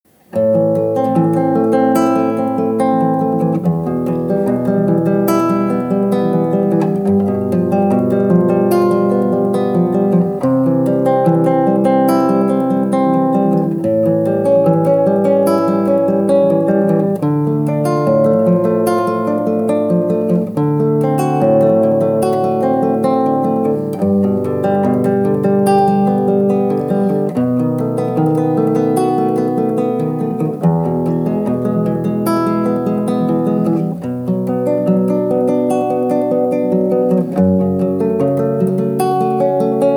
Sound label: ETUDE ARPEGGIO